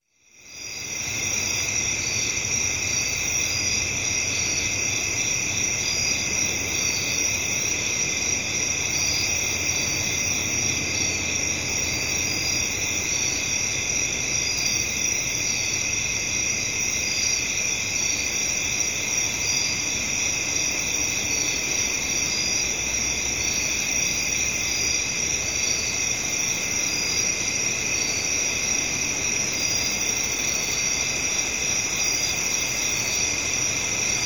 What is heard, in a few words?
Residential Night Time Crickets, traffic In Bg; Ambience; Sound Ideas; Series 2000